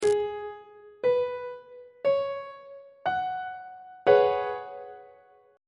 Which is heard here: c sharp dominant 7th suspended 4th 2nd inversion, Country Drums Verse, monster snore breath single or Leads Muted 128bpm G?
c sharp dominant 7th suspended 4th 2nd inversion